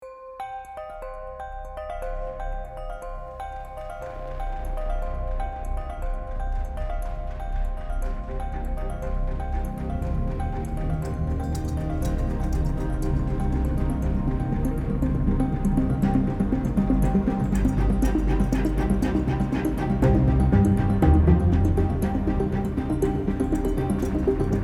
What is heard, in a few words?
glass and steel